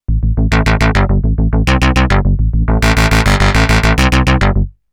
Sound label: hybrid bass; novation peak test